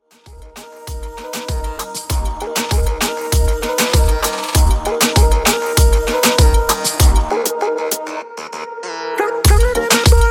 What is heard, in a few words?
Party Moombah, 98 bpm